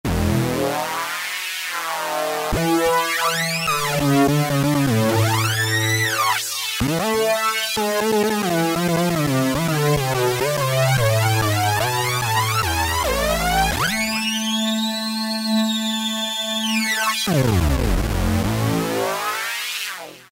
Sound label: Korg microKORG Modulation Lead; Factory Voices